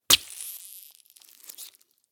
CLEAN STABS, BLOODBATH; PMSFX

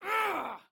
Male Serial Killer Attacking, Screaming; 344 Audio

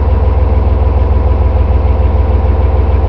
apc loop; hl2

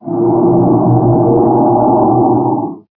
water growl, ichthyosaur; hl2

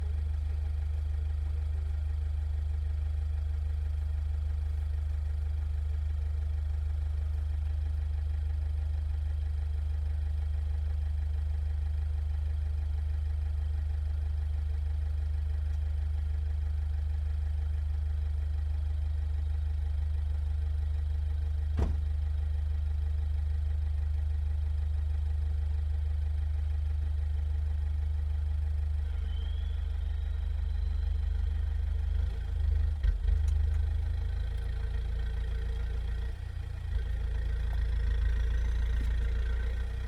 Citroen C2 Drive Stop EXHAUST; PMSFX; DPA4062

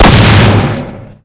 shotgun fire; weapons

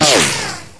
near miss, weapons

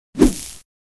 stunstick swing, weapons; hl2

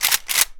gun semi auto rifle cock; Gamemaster Audio; Gun Sound Pack

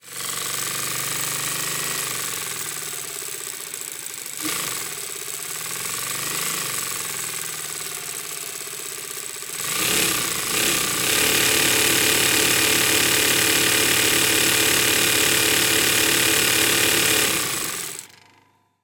Sewing Machine; SoundBits; Electro-Mechanics ToolKit

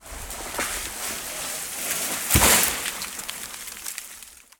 Tree Crack and Fall; Mechanical Wave; Lumberjack

